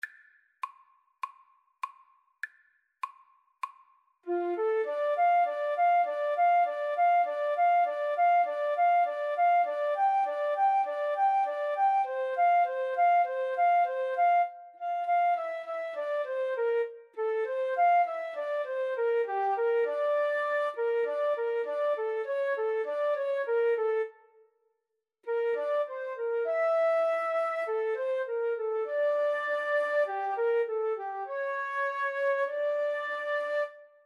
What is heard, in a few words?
Flute duet